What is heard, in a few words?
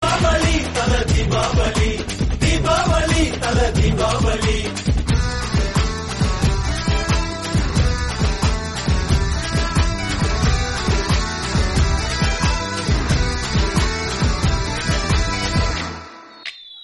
Tamil Song Ringtone